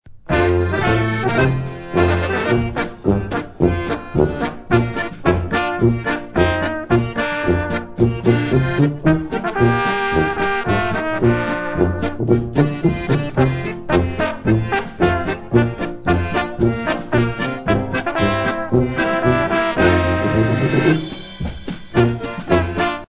POLKA

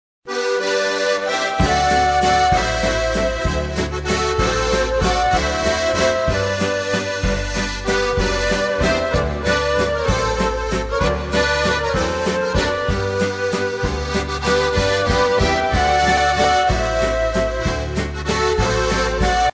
WALTZ

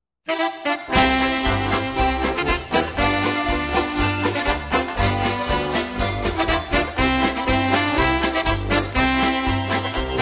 MAGIC FLUTE POLKA

